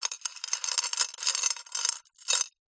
household jewelry bracelet metal movement on glass; zapsplat